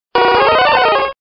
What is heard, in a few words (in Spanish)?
Grito de Cloyster